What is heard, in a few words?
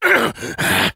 loud grunt